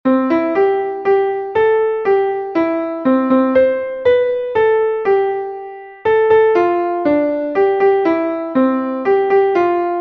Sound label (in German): klavier; MelodieMeister